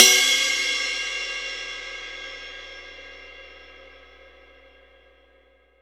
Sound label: RIDE, percussion reverb; MARKYDRUMS